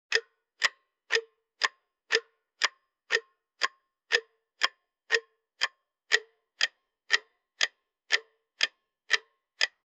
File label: deep metallic clock hand, clock